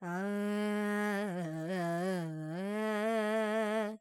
Purvi Kalyani, Vocals, Carnatic Female, Alaps, Dry; Sample Banks